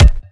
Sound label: Soft Kick; Vengeance Samples